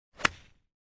Kljushka hokkei

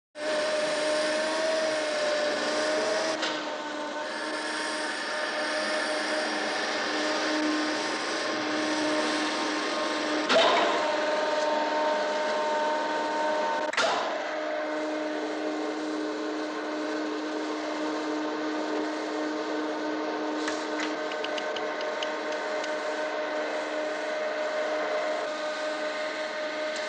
Press gidravlicheskii

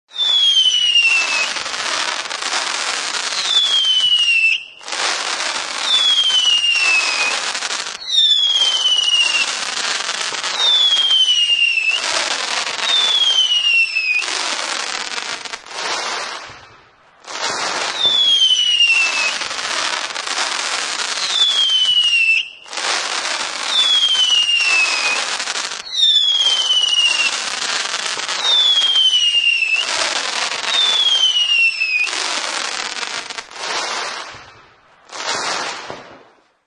Svist feierverka

